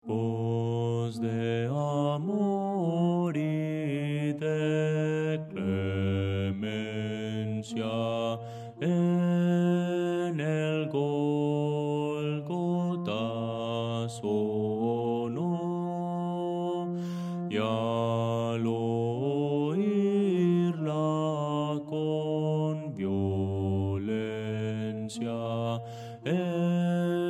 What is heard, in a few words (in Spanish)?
bajo